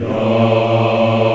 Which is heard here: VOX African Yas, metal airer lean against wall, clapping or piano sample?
VOX African Yas